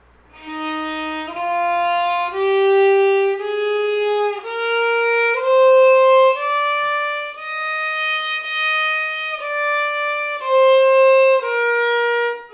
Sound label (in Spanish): mi b escala, violin; mi primer libro de escalas